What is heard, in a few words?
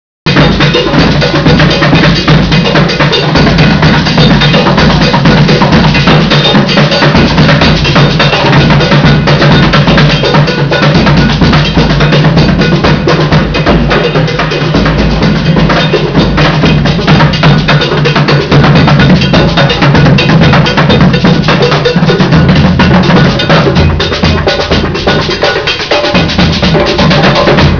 subway drums